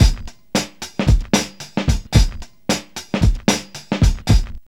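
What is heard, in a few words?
112 bpm, Funky loops; Zero-G; Funky Elements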